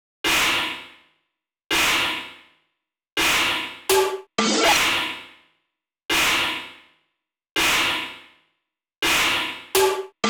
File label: snares